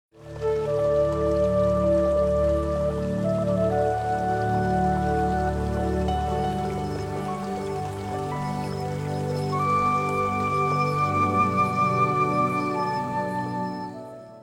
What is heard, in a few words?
Tranquil background music; Nokia; Audio themes